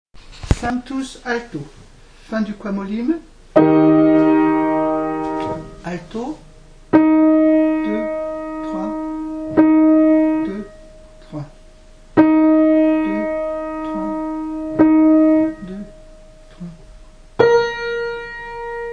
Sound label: Sanctus, Alto